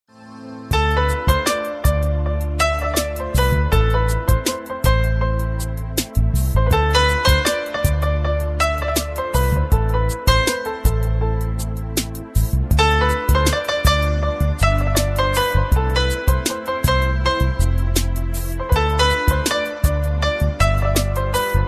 Tune

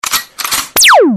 Laser Gun

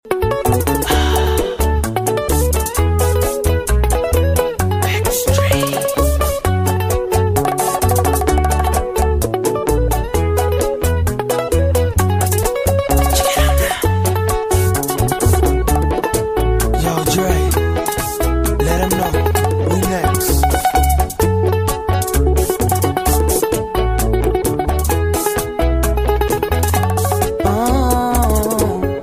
Bachata style

